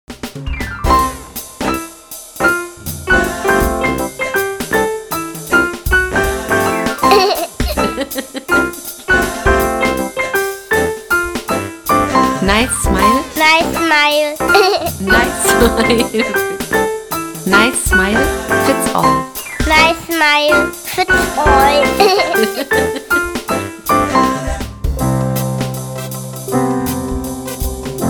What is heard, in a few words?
Instrumental